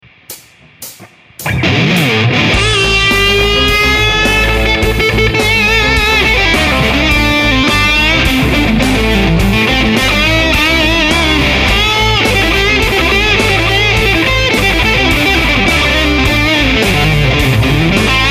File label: kaatusiki guitar